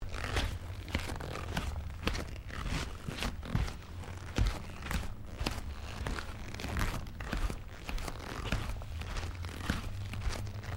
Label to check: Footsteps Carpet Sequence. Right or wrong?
Right